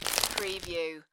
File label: Snow and Ice, Ice stretching